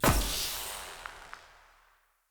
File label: Energy Blade Projectile; Weapons; Swords